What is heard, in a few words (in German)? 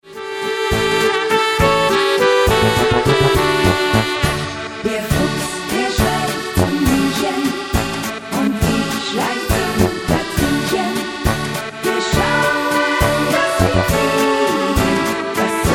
mit Chor